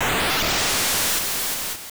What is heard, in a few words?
Woosh